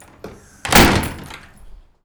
Door Slams; doorSounds

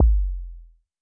808 BD long, Roland-808 Drum Hits Bass Drum

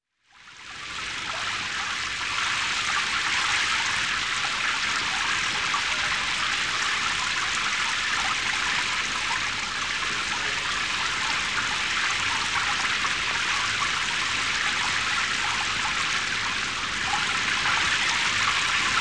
raining

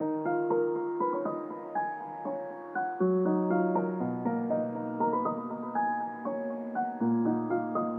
cinematic pensive piano Key Eb 120bpm

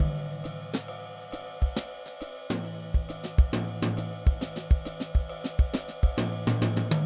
Lounge Jazz Drums

